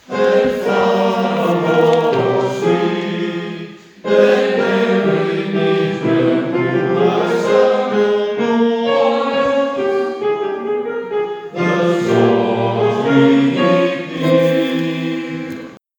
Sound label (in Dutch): Musics Echo Tenor en Bas